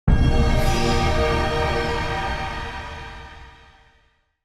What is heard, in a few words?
bonus reveal start